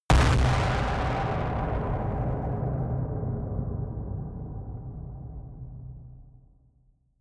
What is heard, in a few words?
distorted explosions stereo